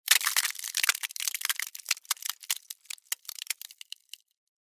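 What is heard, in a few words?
ice trash hit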